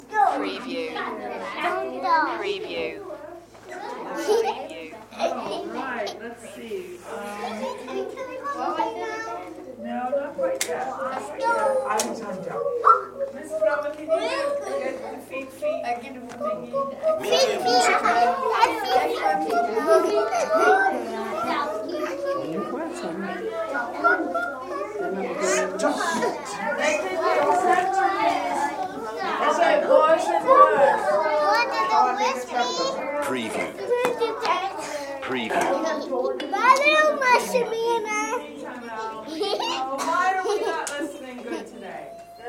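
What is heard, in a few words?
Preschool Ambience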